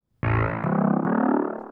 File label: TEKNO 140, Techno; Techno2; Sphere